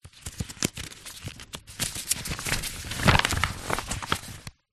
paper document thick many pages search through trying to find page